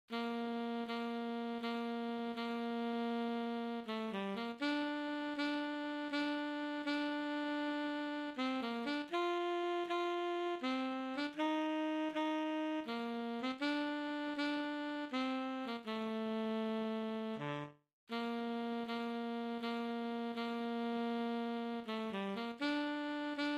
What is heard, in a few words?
tenor sax